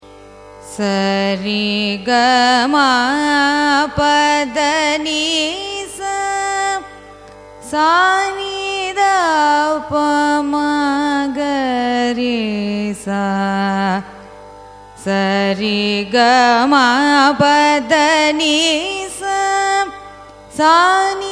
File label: raga pantuvarali arohanam avarohanam, carnatic music